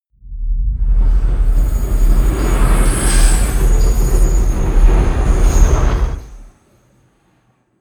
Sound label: spaceship spaceship grind large